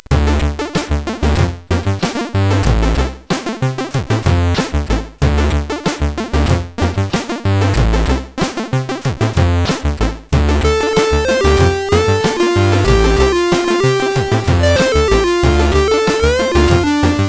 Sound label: funky, CSG8580R5; hvsc; Moon